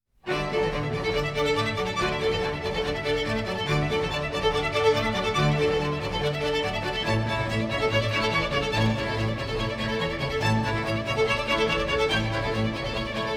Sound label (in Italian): Concerto per archi e continuo in D Major, Allegro